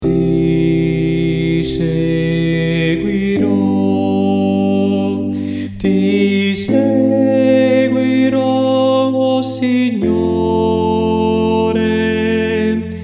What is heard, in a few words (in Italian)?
soprani